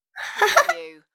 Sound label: Child Laugh; beanstalk audio